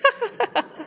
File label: haha